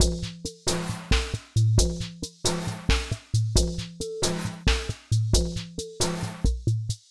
jazzy beats and percussion 135 loop

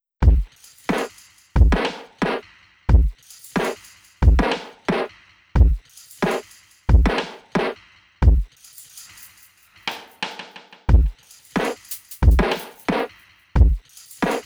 A Flute

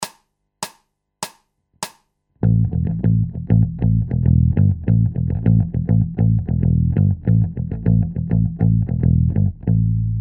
Bass Loops

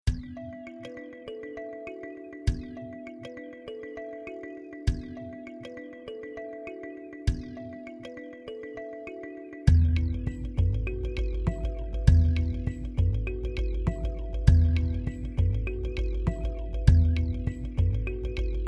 Laidback Groove

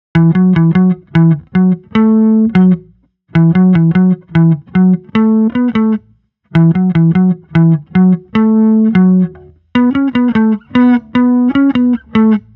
bass

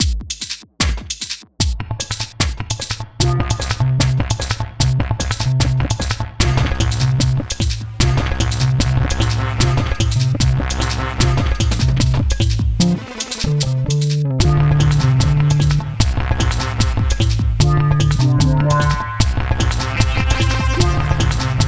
Five Beats Per Second

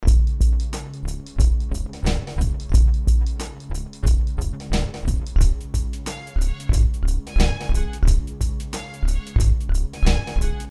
C 90 Groove; Marais

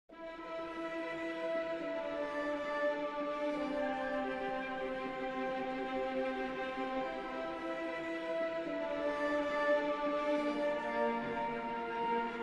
Twinkling Orchestra

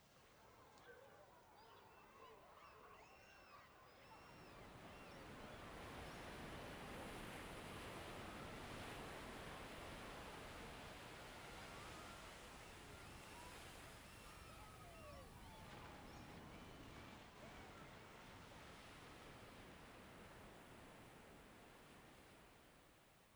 Ocean Sounds

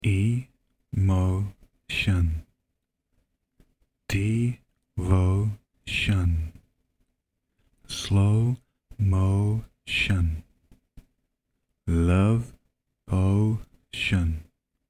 Em 120 vocoder emotion

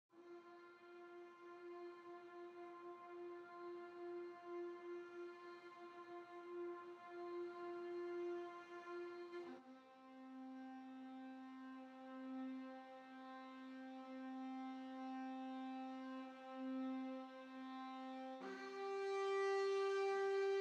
Basses Harmonics Sus

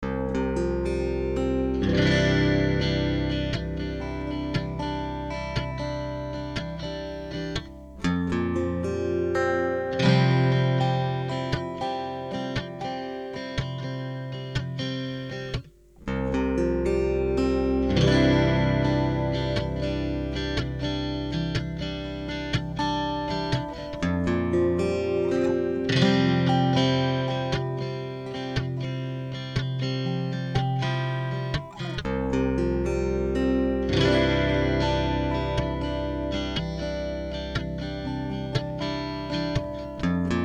guitar arpeggios strums in C

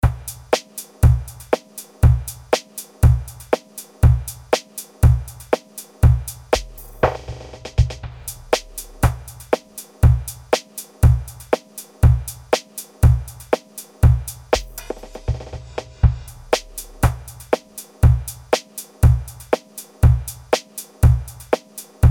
Jazzy Lil Funk Groove, Em 120

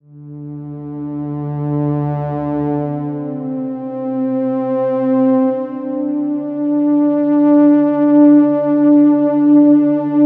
Dm90 PAD

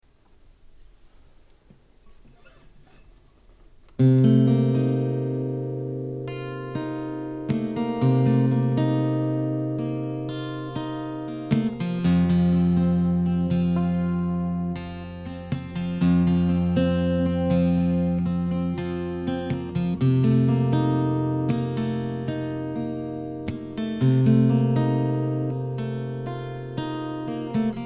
F120 GTR Alt Tun; Ozone

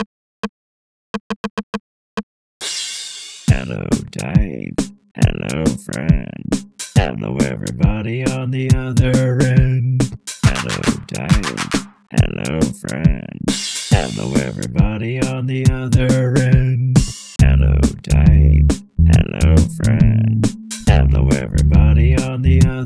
mono boost

